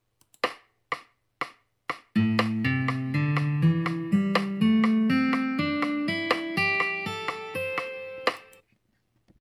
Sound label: guitar